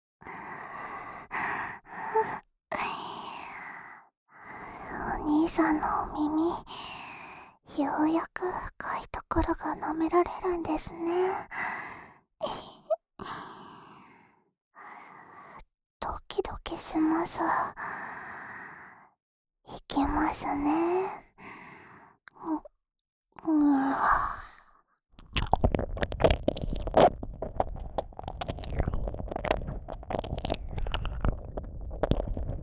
深くはげしい耳舐め（左耳）; 究極耳舐め; 幼妻姉妹と送るいちゃらぶ新婚耳舐めASMR

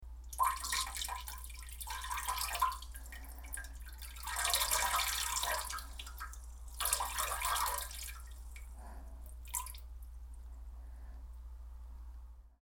おしっこ ちょぽぽぽじょぼぼぼじょぼぼぼっ, トイレ盗聴 排泄音; 排泄音集

